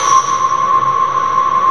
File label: Crystal C4, lead; Digital Sound Factory Xtreme Lead